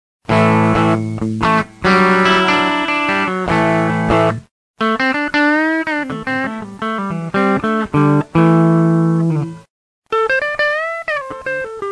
Music Light OD

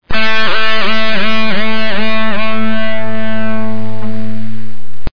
Music Simple Down

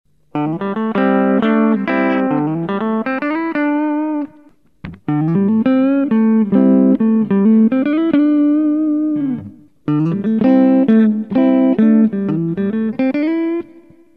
Pickup Position

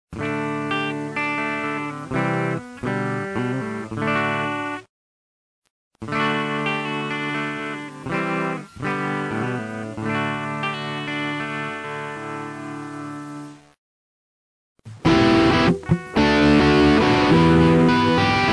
Pan

